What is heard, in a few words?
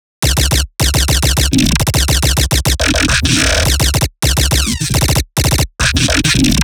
Riddim Bass Loop Artillery 140; Ghosthack; Riddim Essentials 2020